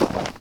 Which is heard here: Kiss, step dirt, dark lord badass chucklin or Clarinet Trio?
step dirt